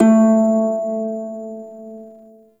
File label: Prime Shawzin Oct One A; Warframe